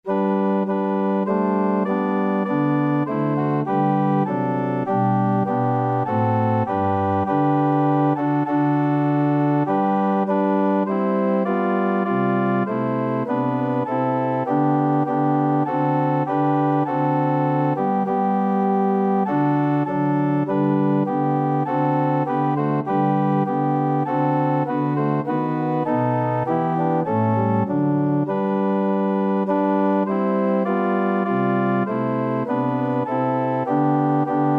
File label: organ